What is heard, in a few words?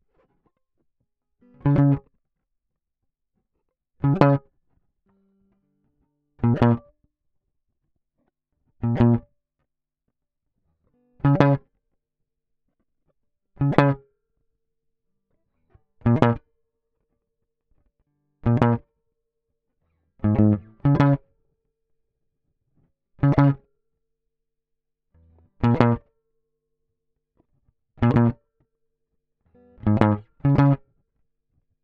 git pluck; logic